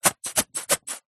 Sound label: hair gel pouch squeezes empty just air; household; zapsplat